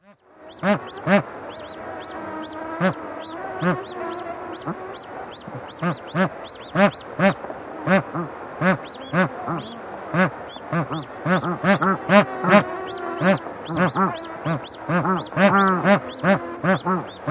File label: anser indicus; para gęsi tybetańskich i gąsiątko